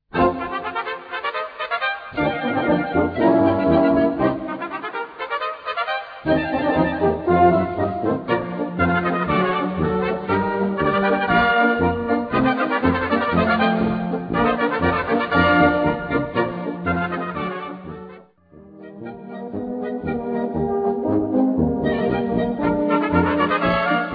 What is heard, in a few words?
TROMPETE; SPRING